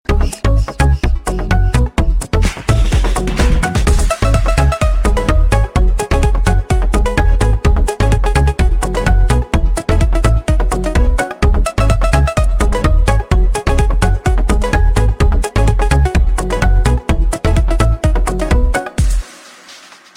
Business Tone

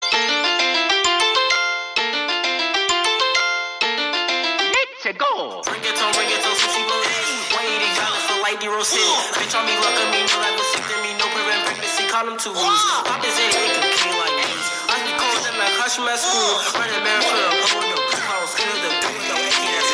Ringatone

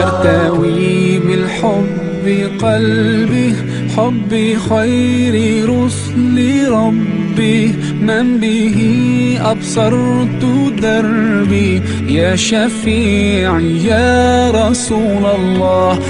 islamic

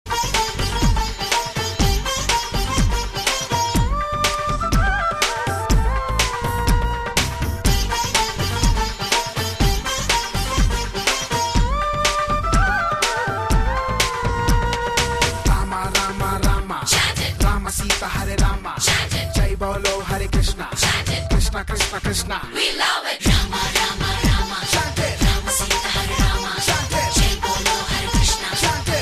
Fusion Telugu

